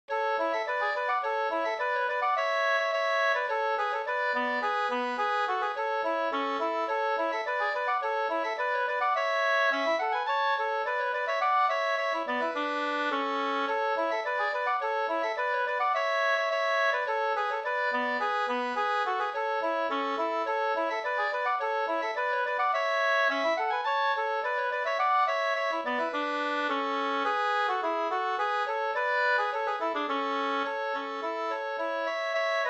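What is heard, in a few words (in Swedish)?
slangpolska, two-part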